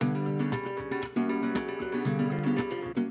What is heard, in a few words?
Flamenco Passion, ringtone